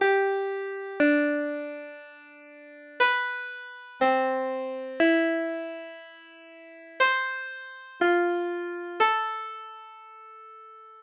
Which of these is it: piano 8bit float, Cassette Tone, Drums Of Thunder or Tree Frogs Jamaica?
piano 8bit float